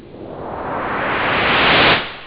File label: whoosh; da capo vol1; instruments